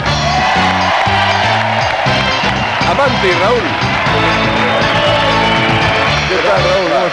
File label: Guest fanfare